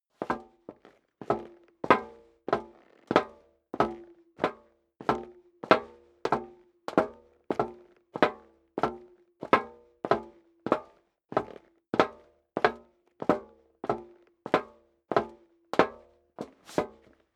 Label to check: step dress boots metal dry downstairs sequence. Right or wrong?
Right